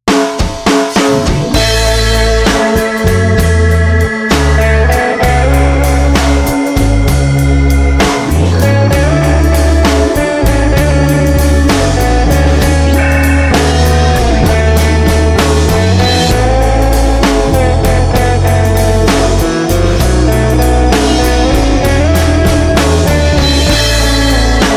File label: Blues Rock 130